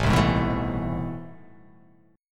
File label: A Minor Major 9th, down